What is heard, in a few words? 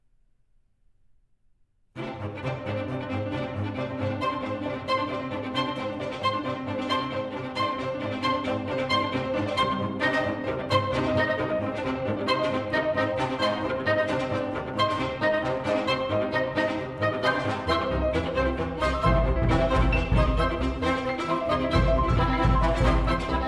chamber symphony, orchestra